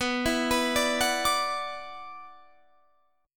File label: F sharp 7th Suspended 4th Sharp 5th B